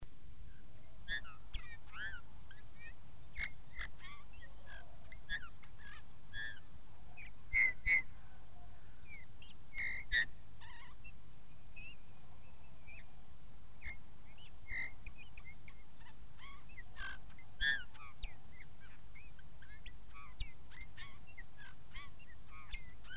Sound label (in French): Bouvreuil, oiseaux; Sons Oiseaux